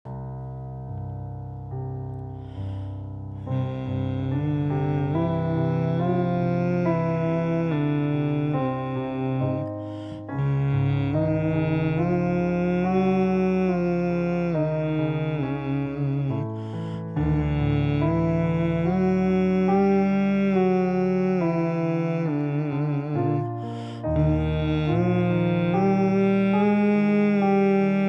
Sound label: Hmm Chew; daily workouts for beginners